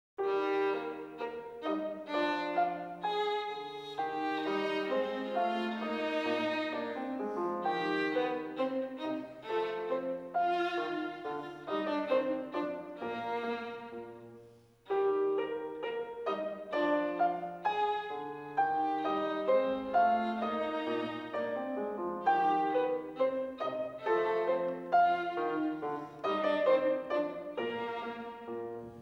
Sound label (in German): Violinsonate Es-Dur - Tema, allegretto; Mozart; Chamber Music; Violin Sonatas